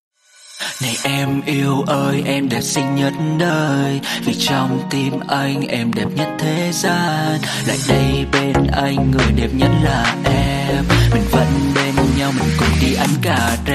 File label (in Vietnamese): Nhac Chuong Cha Cha Remix